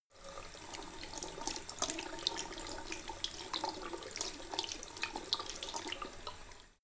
sink soft flow wash; haus garten; badezimmer

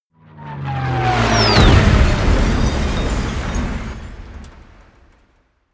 air strike; krieg waffen; flugzeuge

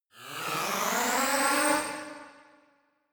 fake orchestra short; whooshes rises; risers

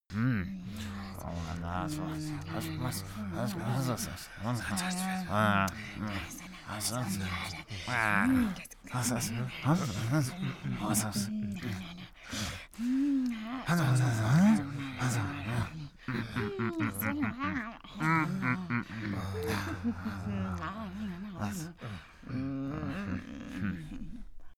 crowd grumpy walla

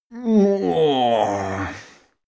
male moan stretch; soundpool

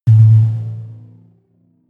sci-fi vehicle engine stall low; raumschiff

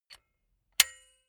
silicone gun bullet lock mechanism heavy; berufe; handwerker; soundpool

